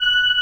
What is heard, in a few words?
BOYS; AKAI S6000 CD-ROM - Volume 1; VOCAL ORGAN; B VOICE & BELL